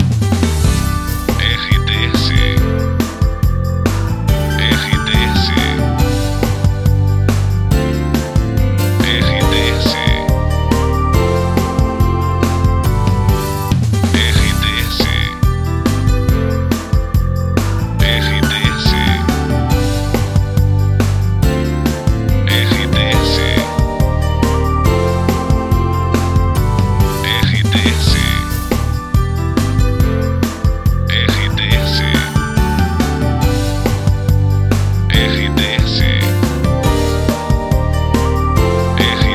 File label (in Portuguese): trilha para locução; Prata FM 2021